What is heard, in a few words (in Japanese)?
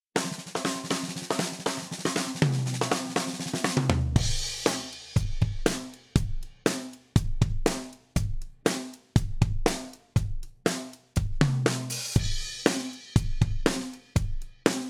ドラム サウンド 8ビート